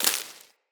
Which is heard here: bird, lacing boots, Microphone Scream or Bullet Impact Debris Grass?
Bullet Impact Debris Grass